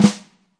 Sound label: real, drum kit; REAL LIVE KITS; A Real Freakin Drum Kit